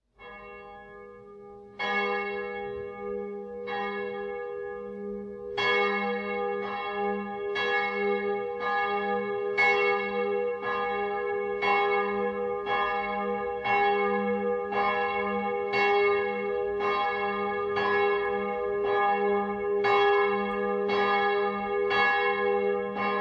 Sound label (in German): Glocke gis, Domglocken; Maria